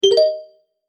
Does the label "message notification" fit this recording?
Yes